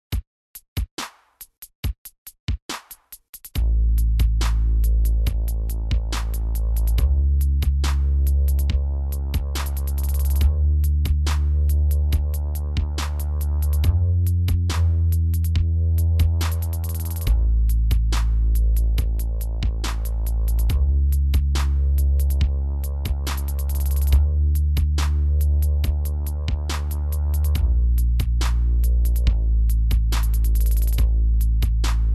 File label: backing tap chords